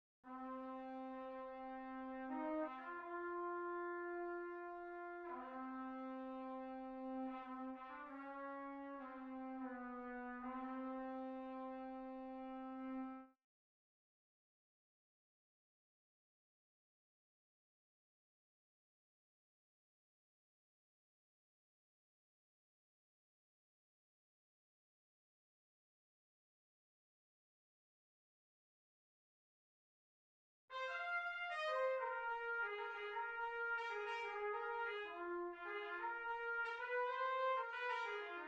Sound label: Trumpet in Bb; OSS 117